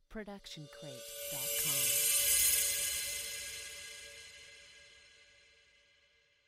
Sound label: Metallic Magic Movement; SoundsCrate